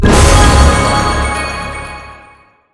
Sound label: bonus game end; silverback